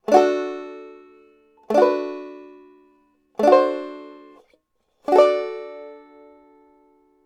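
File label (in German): beispiel fuer akkordmelodie, banjo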